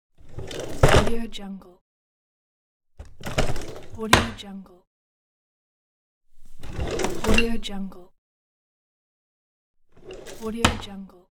bathroom vanity opening closing drawers

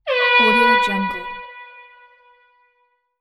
air horn blast in stadium

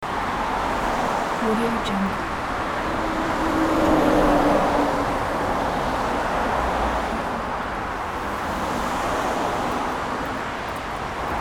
ambience vehicles passing right to left loop pack; inspectorj

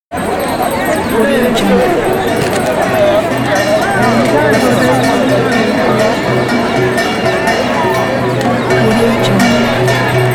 india city ambiance

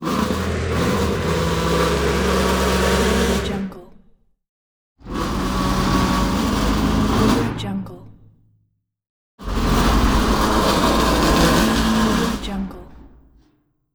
heavy metal object movement

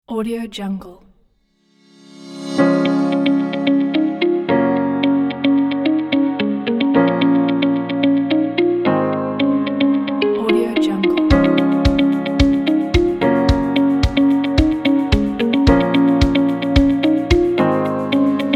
inspiring corporate music pack

